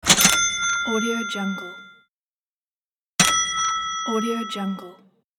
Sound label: cash register